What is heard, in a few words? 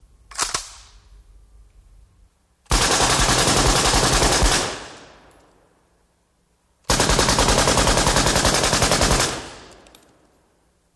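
Machine Gun Cock Shot; bursts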